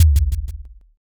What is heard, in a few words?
kick